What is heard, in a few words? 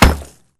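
Bullet Light Ballistic Concrete impact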